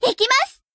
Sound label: chain start